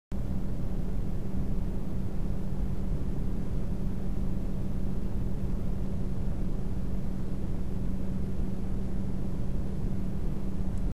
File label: Cougar Vortex Vortex HDB 12V